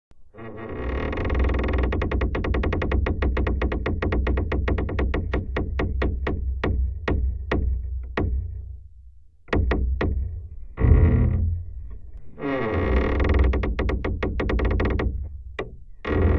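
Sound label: ambience creaking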